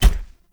King Step Default, Footsteps; Enemy; Mendicant; Mnd King

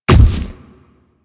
Splitter Step Default, Footsteps

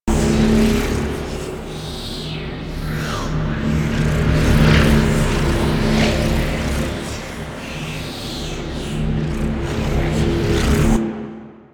Moon Energy Large Loop; Warframe; Ambience; Orokin

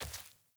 Sandals Walk Dirt, Footsteps; Characters; Player

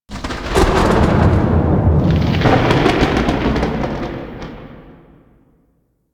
Void Storm Explosion; Ambience; Warframe; The New War; Quests; Zariman

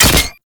Scaldra Pistol Low Clip

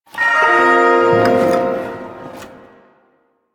Ersatz Shawzin Unequip; Warframe; Duviri

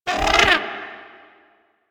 Bird Three Chuff; Warframe; Ambience; Entrati

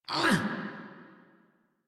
Pain, Battle; en; Warframe; Grineer; Catbrow Handler

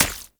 Flock Attack Hit; Powers; Fey

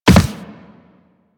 Land Soft Default, Footsteps; Warframe; Operator

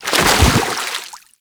Roll Forward Foley Water A; Enemies; Warframe; Teshin; DE

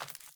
Sandals Run Vegetation, Footsteps; Characters; Player

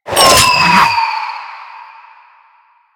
Grenade Proj By; Warframe; Lasrian; Lasrian Soldiers; Weapons; Lasrian Goonadier Grenade

